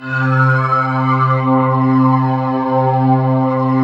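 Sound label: synth Morpheus; Sound & Vision - Gigapack II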